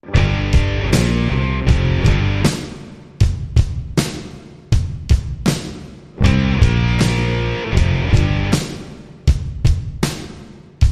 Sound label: Rock, Underscore